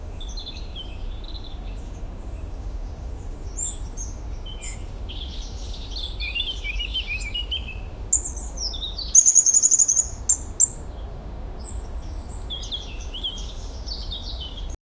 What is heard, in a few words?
baby bears playing in a, birds singing